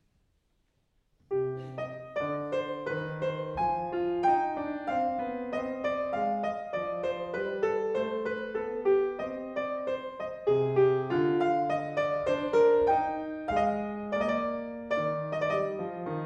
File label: menuet; suite 2 in c bwv 813